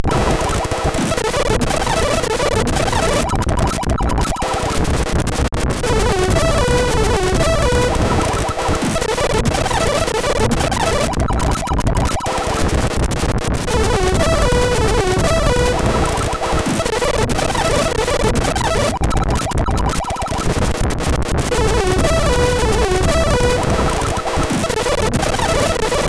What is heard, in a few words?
Disco Bass, SIDTunes